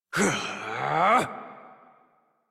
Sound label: Exert Adult, Melee; Warframe; Duviri; Drifter; en